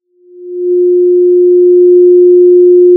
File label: pop, Sound of a cruise ship horn